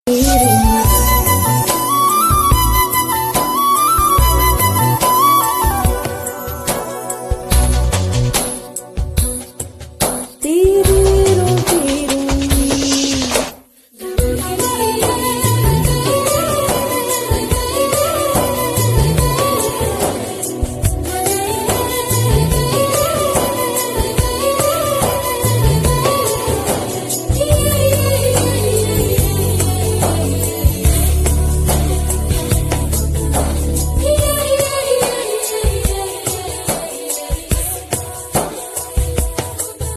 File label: Tamil Songs Ringtone